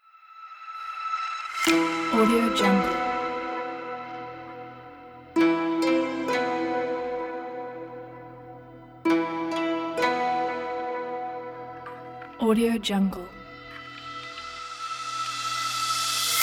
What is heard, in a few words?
dark japanese hip hop